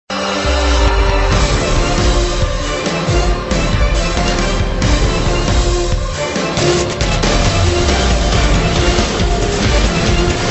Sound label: themes, ringtone; saw final